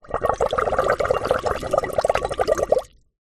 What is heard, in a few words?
household water bubbles through straw into metal pan